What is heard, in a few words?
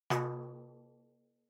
Tambourine Head